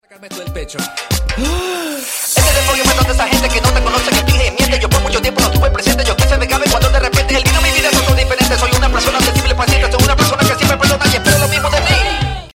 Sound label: rap in spanish funny ringtone